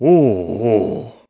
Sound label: Ohh Ohh; Gaepora: "Ohh, ohh..."; Skyward Sword